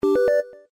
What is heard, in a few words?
Call Connected; LG KV500 Cookie